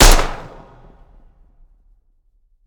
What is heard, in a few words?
shot